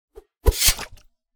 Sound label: sword, weapon attacks; psfx